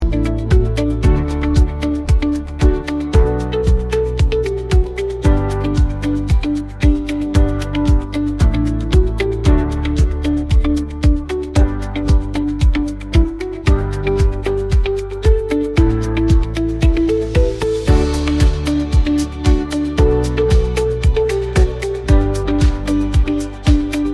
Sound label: Generic music mid tempo